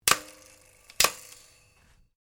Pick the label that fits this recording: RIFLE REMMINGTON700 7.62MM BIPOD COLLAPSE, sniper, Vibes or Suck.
RIFLE REMMINGTON700 7.62MM BIPOD COLLAPSE